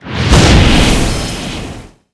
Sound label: Supernova Target; Knight Online